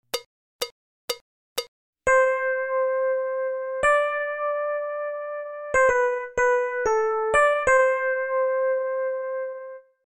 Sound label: Swing; Jazz Harmonia